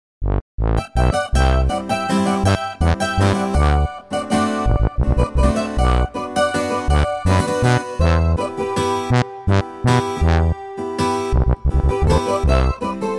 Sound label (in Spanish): Sierreno Tuba; Tierra Caliente